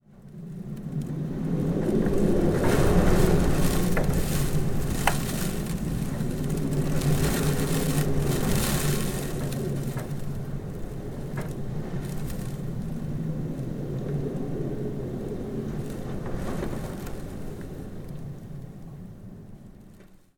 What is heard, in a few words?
February Storm